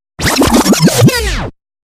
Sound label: scratch scratch